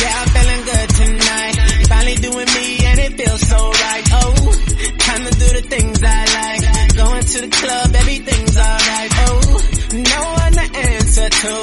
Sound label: 95bpm A